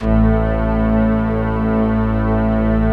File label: ORG STRNG